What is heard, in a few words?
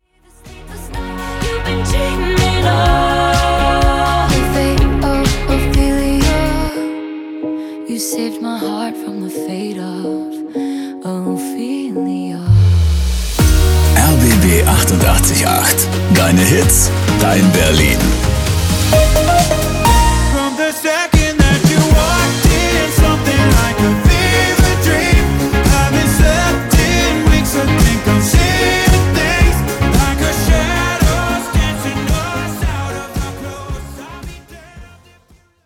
Transition Up Instrumental